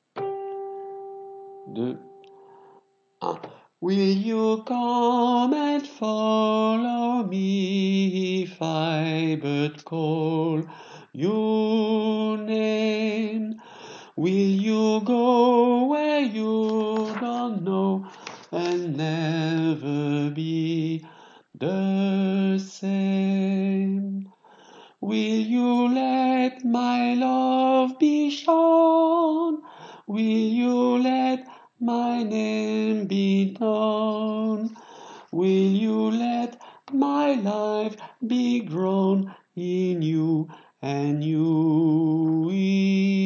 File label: sopranos, chorale